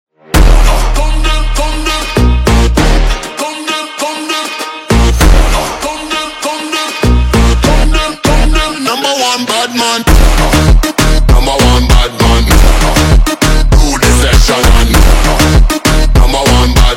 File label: Phonk, Slowed